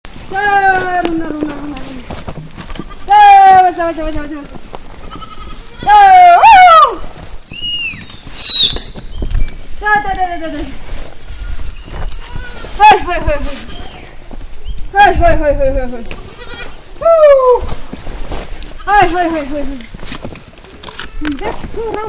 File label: call to prayer in Gilgit